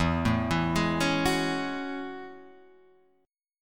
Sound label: A Major 13th, guitar